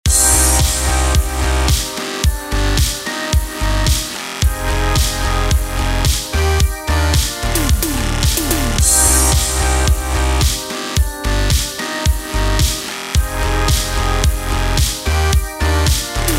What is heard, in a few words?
Synthwave; Airbuzz Recordings; Sample Pack